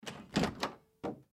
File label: Door Open Solid Wood; Miscellaneous Doors (Sound Effects:Doors); Blastwave; Foley